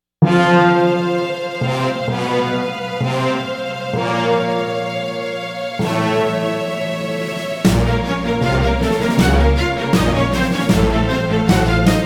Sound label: Outro Music; Super Meat Boy; PS Vita